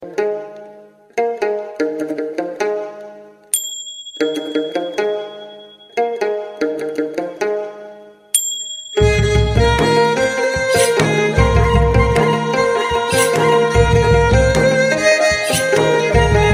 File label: folk